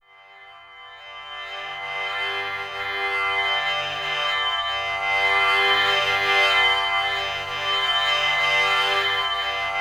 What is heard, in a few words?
TAMP DRN G2; Spectrasonics; Heart of Asia